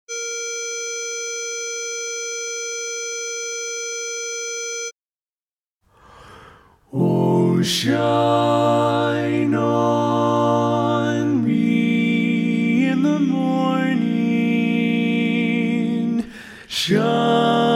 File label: Lead, Learning Tracks, Barberpole Cat